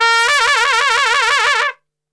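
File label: A#1 trumpet shake, Brass; Best Service; Brass Super Section; TRP SHAKE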